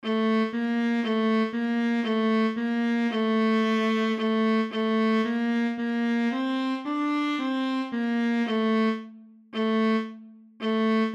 Czardas Bratsche

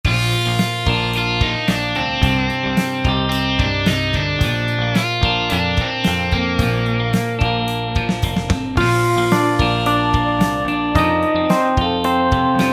Playback B Dur

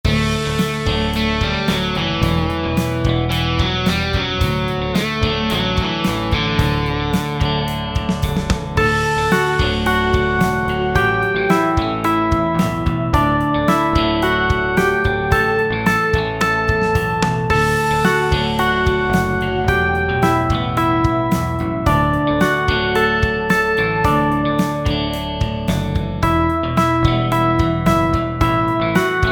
Playback D Dur

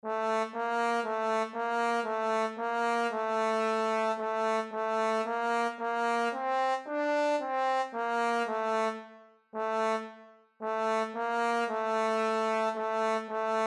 Czardas Posaune

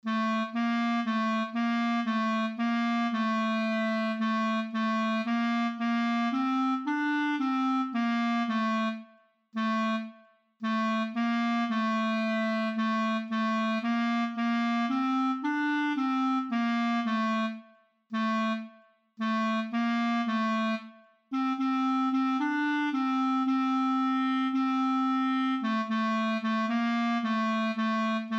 Czardas Klarinette

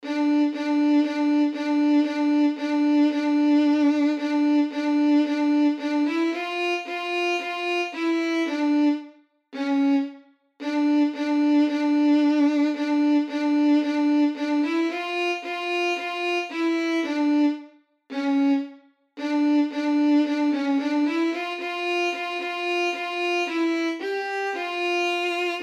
Czardas Violine

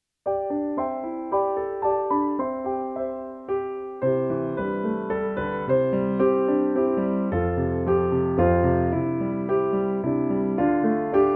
Viel Glueck und viel Segen in C

